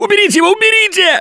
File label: scream while pounced; Gambler; russian